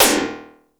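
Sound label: plastic snare; 90 sSampleCDs; Crypton - DrumBase5000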